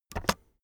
keyboard external space press fast

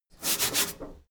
mechanic sink brush

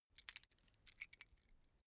key ring small pick one

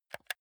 console controller r1 press

